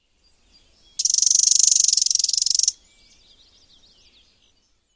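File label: chatter call, Common Yellowthroat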